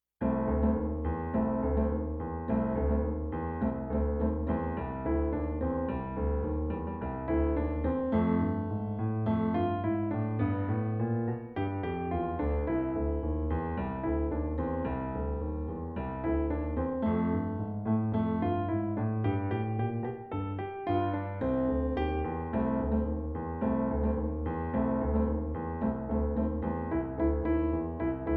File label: christmas jazz medley, intermediate piano